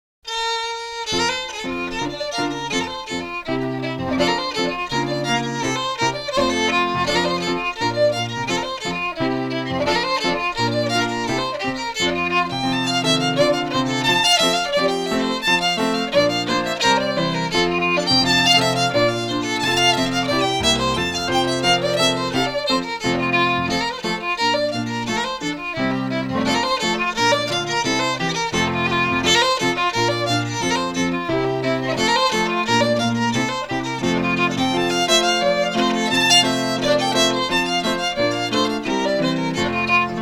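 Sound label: Reel, Scottish Fiddling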